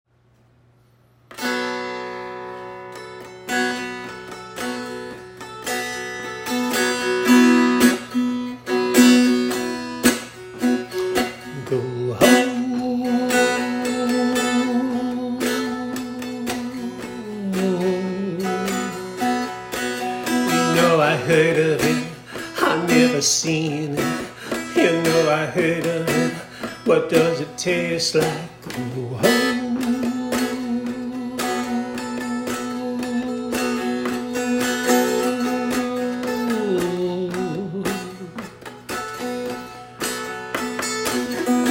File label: gold with a dulcimer and semi improvised; Gold with dulcimer – semi improv (Stumpy)